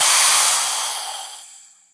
sentry upgrading steam, weapons; tf

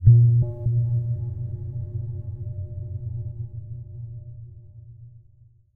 editor blips; Source Engine; Portal 2

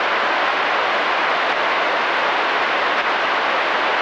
tv static

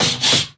taunt bunnyhopper hop; video games; source engine; tf

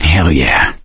positive noise, gambler, survivor voice

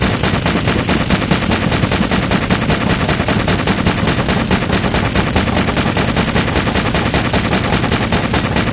jackhammer loop; video games; player; taunt; source engine; tf

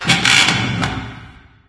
amb wood creak, ambient organic; video games; source engine; portal2

